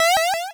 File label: Cry